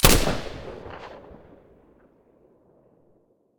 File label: silencer sa80 stereo